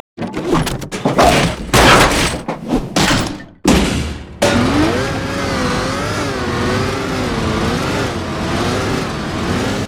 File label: idle recall; LoL; singed